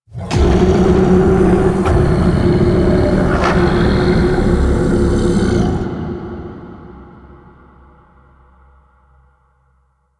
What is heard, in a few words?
Sand Vocals; Warframe; Man In The Wall